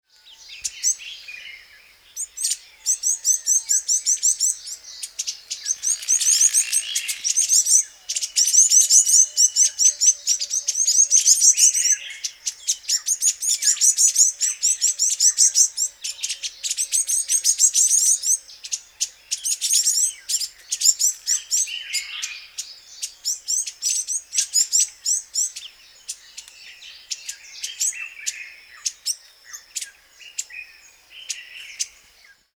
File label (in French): cordonbleu d'Angola